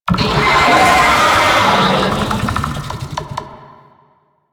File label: Proto Infested Babau Idle, Vocals